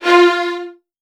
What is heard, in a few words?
VN 16TH, 2F1; ILIO; Kirk Hunter